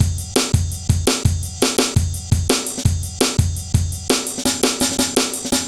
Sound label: heavy live ride, drum loops; Zero-G Jungle Warfare 1, 2 & 3